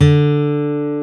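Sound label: ACOUSTIC G, acoustic guitar; E-MU Producer Series Vol. 2; More Studio Essentials; Composer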